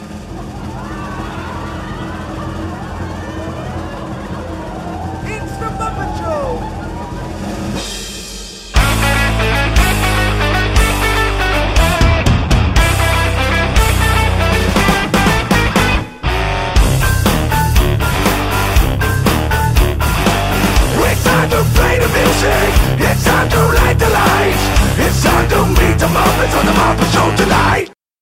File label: metal ringtone